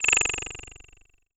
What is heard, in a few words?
Creature